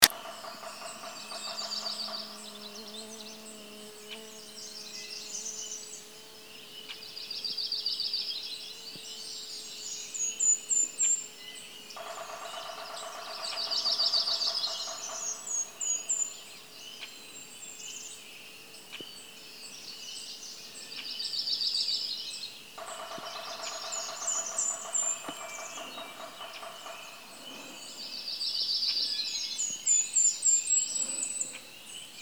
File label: Morning Chorus at Cropsy Pond; Day After Birdathon; Birdathon 2015; exiting the backcountry from Cropsey Pond